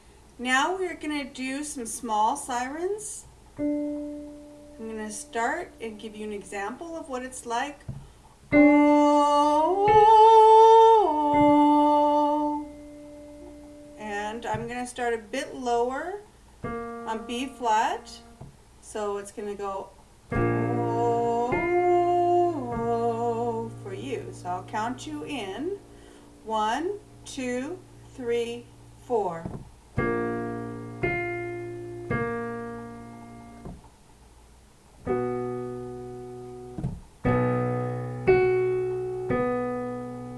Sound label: oh oh oh slide, warmups